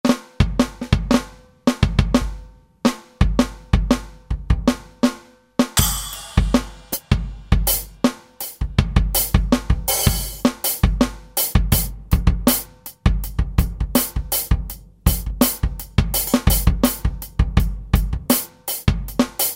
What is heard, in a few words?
Funk Kit